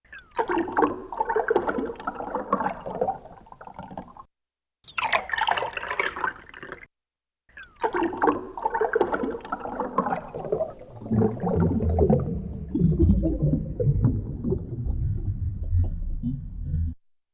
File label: pouring II, Yamaha TX16W; Sound FX